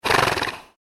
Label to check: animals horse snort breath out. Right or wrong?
Right